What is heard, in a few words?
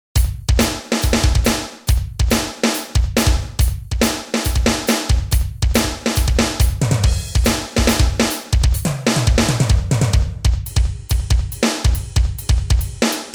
altern